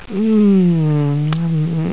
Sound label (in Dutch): kreun